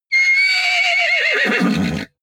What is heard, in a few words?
horse vocal neigh